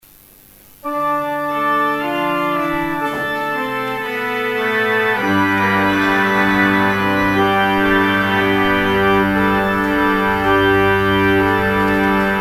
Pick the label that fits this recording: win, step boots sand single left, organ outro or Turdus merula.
organ outro